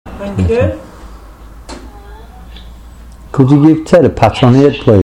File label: haaaa